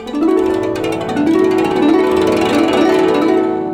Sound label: glissando